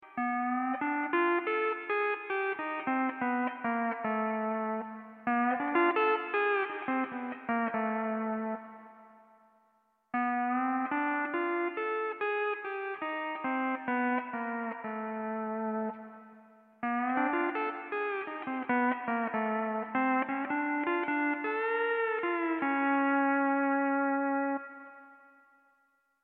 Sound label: hawaiian piece